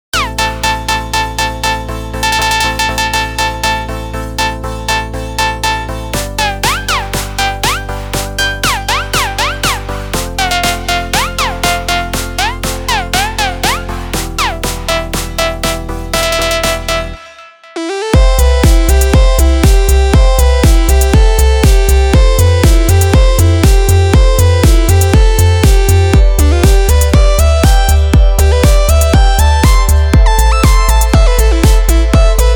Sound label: Dance electronic